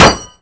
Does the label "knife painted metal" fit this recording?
Yes